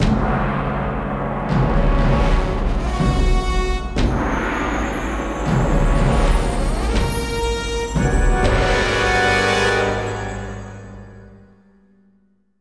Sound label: Temple